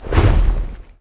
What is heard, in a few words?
eagle wing; Gamestar